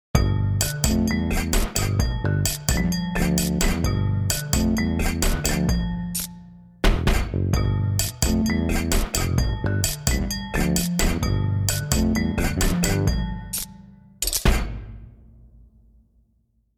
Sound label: kick